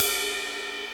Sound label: Ride, Drum Hits; Hybrid Kit; Drumdrops Recording Hybrid Kit